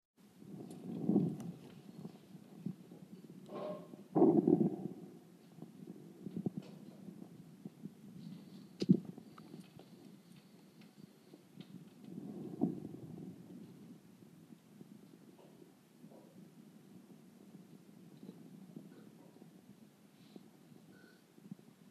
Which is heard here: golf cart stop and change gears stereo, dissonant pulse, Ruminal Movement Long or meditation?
Ruminal Movement Long